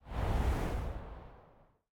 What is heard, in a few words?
slide; Smite; god Medusa v2